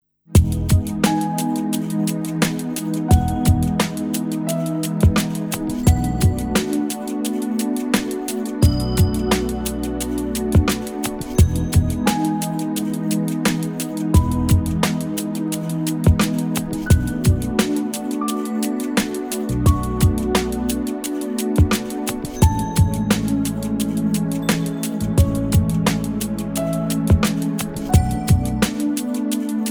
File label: Chilled Ambient